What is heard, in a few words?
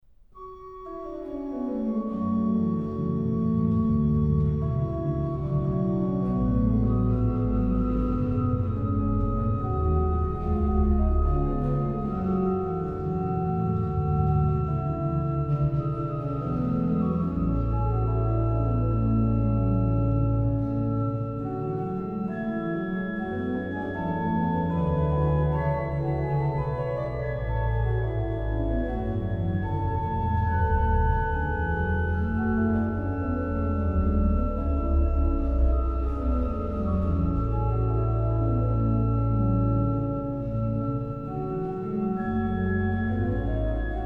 Vom Himmel kam der Engel Schar, organ; BWV0607; BACH; BACH ORGAN WORKS; CHORALE PRELUDES